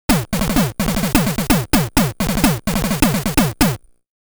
Drum; legend of zelda the link's awakening; Siren's Instrument - Thunder Drum

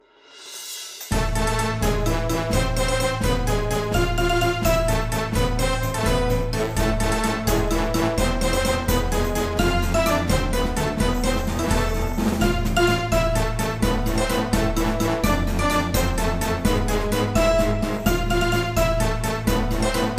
Music Forest; fat princess fistful of cake; PSP

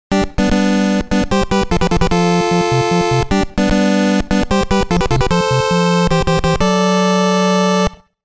Game Start Fanfare; Game Sound Museum Namcot; Star Luster

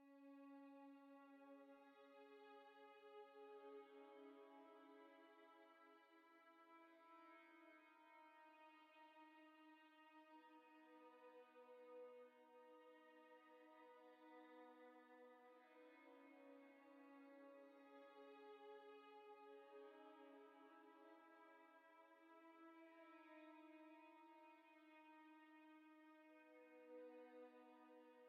violin; Tyranny Gold Edition

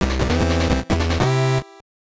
Start Fanfare; Tony Hawk's Pro Skater; GBC

